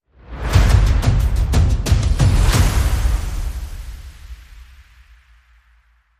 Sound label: Drum Outro